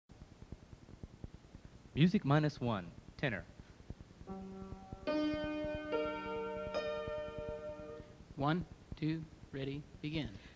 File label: praise the lord tenor, choirs